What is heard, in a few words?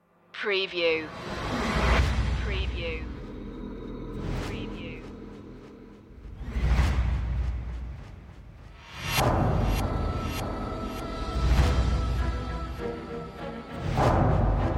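with choir and solo vocal alternate ending no brass sforzando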